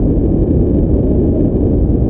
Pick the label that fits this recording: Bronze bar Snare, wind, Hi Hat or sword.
wind